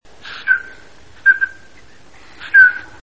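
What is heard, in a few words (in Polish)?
markaczka, głosy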